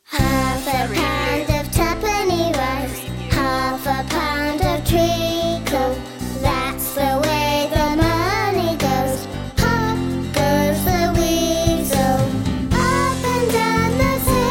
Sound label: children vocals; pop goes the weasel